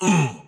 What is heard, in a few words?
Enemy Troll Dead